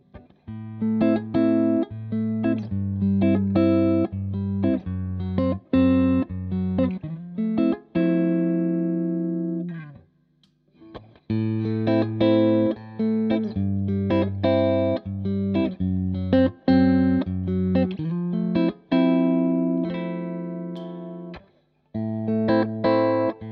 Finger Pick

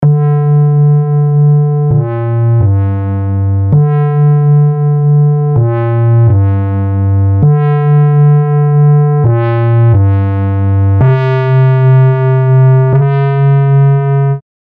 Mosaic Bass Sad Square Macro Modulation; Heavyocity

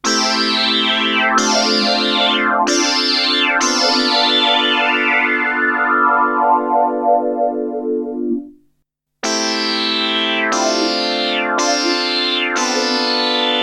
Polysix Poly

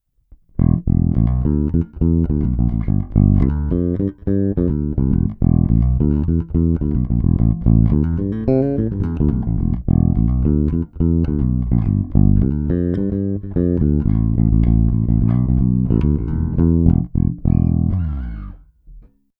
Cort B4 plus AS Both PU active

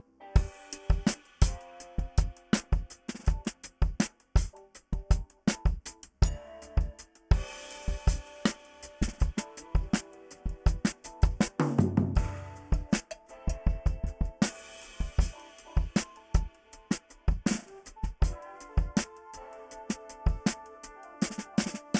Oversampled Drums MIX 50

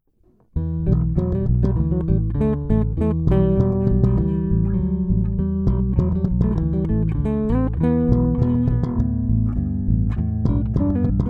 Squier P Bass 4 Trax

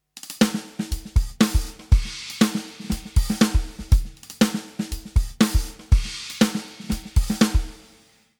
studio drums dry

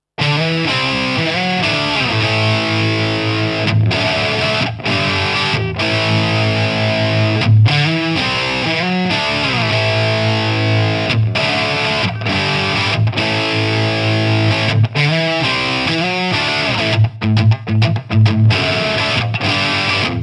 Gretsch Streamliner Lead

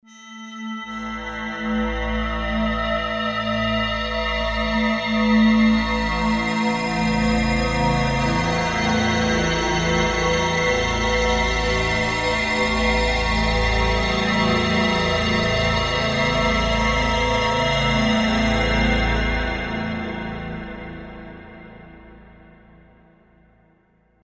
Formanttable

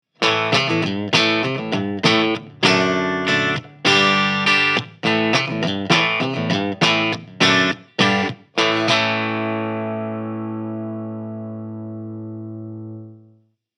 Overdrive JCM 800 Low Input; One Control Cranberry

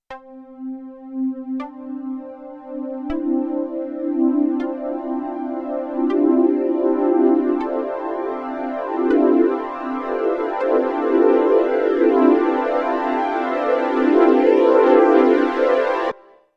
Korg minilogue xd

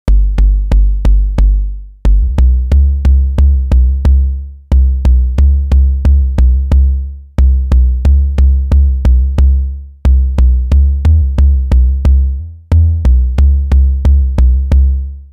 BD Tune

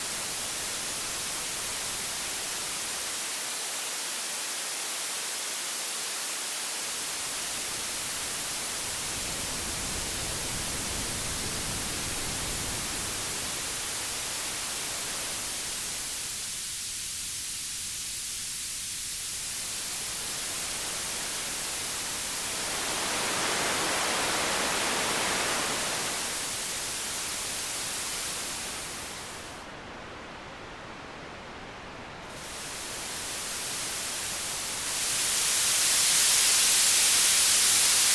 MXR EQ Low Mid High

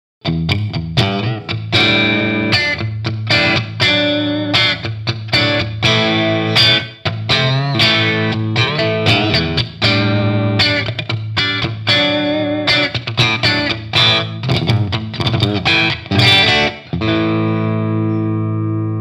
PUs Parallel Crunch

